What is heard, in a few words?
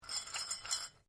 Bell Handbell Priest Japanese Ornamental Rings Rattling; The ornamental rings on a Japanese priests bell; Bells